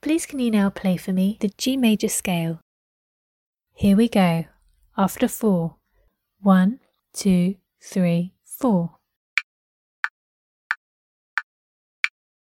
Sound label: g major scale